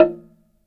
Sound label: HRD SLIT DR, Heart of Africa; SLIT DRM2